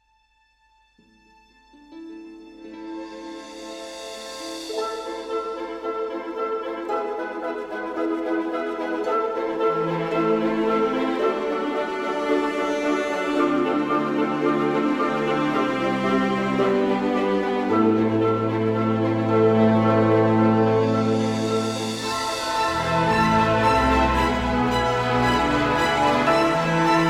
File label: Minimal In Motion; Reuben Cornell; Sonokinetic